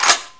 m400 foley

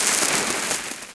firecracker wick

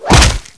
combat knife hit

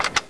xm8 foley

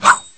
knife miss; knifedragon

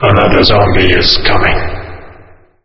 zombie coming

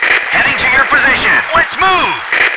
radio tone; zs culvert

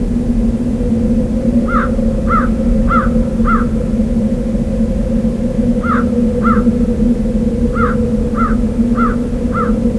wind crow

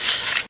knife draw

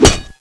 tomahawk hit wall

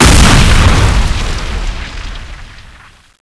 explode

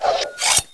combat knife draw

